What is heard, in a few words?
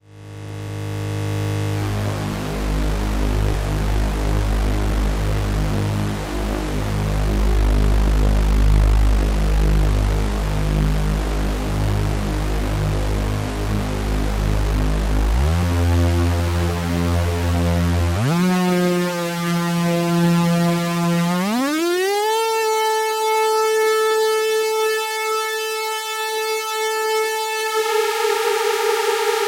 saw